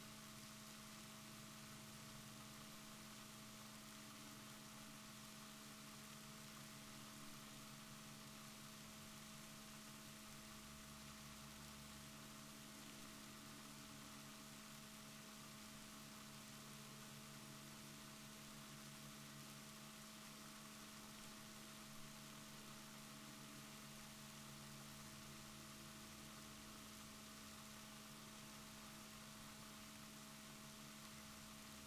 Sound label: rorquals negatif, Balaenoptera Physalus Chile